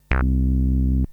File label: Synth hits and riffs